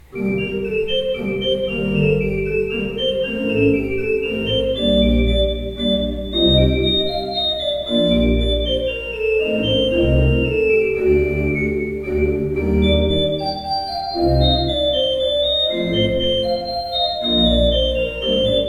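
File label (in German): Kleuker 15 II P, pfeifenorgeln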